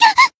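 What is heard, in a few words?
Voice damaged, Japanese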